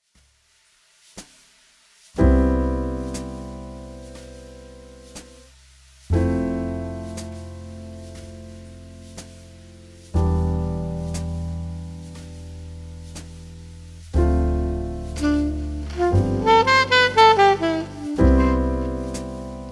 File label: Hotel Lobby Jazz